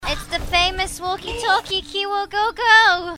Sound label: walkie talkie